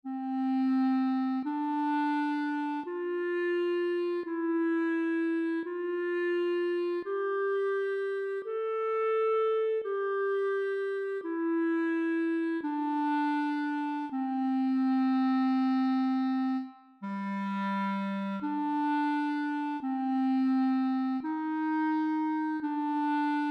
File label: cantus firmus